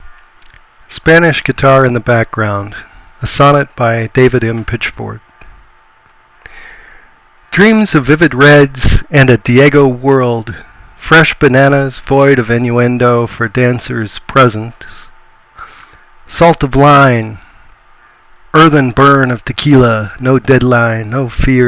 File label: Spanish Guitar